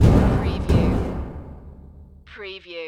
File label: Impact Heavy Metal Hit